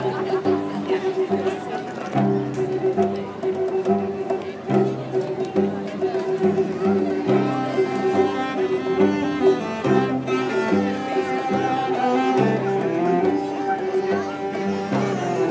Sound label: 5 musicians 1 cello bolero